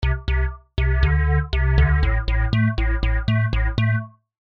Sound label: Synthesizer parameters low pass with mod envelope; I’ve used the mod envelope to modulate the filter cutoff frequency with time.; Note the slightly positive value for MODENV in the filter section!